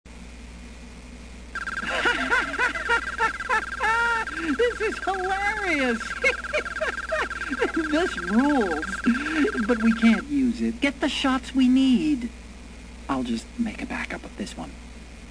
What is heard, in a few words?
laugh; Metal Gear Solid 2